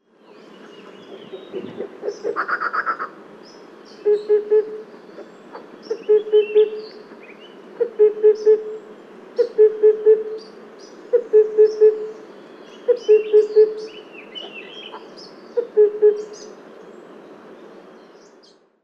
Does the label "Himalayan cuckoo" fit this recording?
Yes